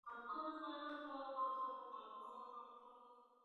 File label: bugle